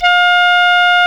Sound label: Flute G3